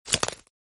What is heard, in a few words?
Curl Up; Rolly